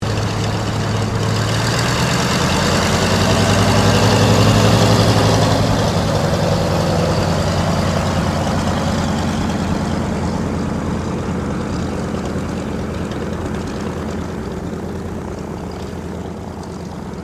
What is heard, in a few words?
AIRCRAFT DOUGLAS DC3 TAXI; Qantas 1942 DC3; Airplane; Airplanes; Vehicles